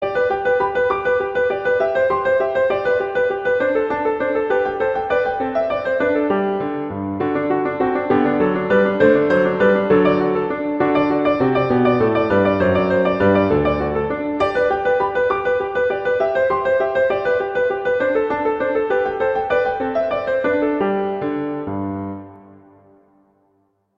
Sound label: Allegro; Haydn; Sonata 8; Hob XVI 8